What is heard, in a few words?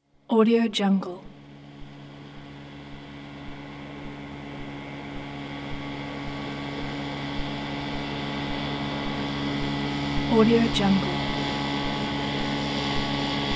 motorboat